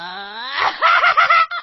launch; gale

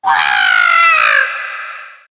toad screaming

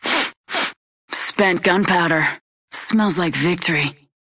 sniff sniff; bangalore

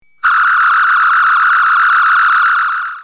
phaser; tos

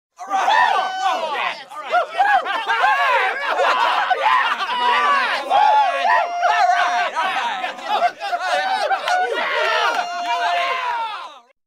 cheering small group of men

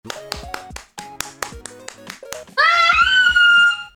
plap plap plap

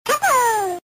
yoshi hippo

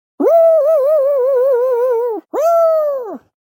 woo woo sound effect; fall guys

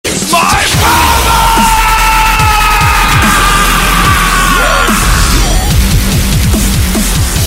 dubstep remix; vegetas rage towards beerus thats my bulmaaa

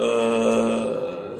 euuuuuuuuuuh

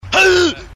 uuuuuug

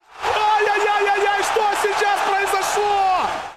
ay ay ay; chatwheel

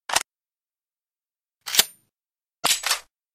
uzi reload

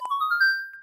curiosity emote; animal crossing

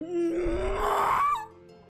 mmmmmuhhh

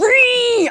reee; linus sebation

